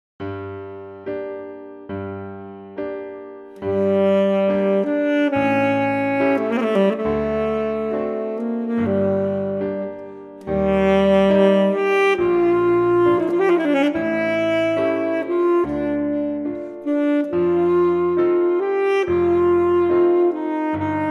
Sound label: Czardas Full Sax